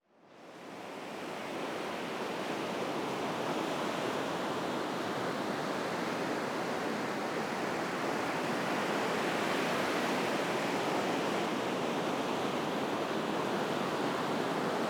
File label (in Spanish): AMB EXT ATARDECER PLAYA Los Botes Rocha UY Olas